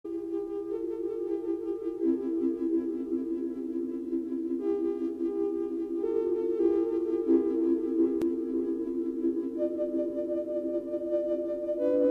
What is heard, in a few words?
Xmas Songs on Buchla